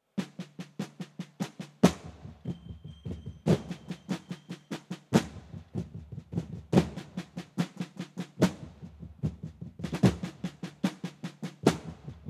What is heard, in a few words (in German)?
Hurry up Song Trommlerzug